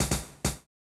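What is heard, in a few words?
Beat - Closed Hats, Grime, 135bpm; Zero-G Sound Sense Grime Scene; Construction Kits; A Positive Vibe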